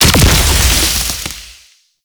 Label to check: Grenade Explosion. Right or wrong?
Right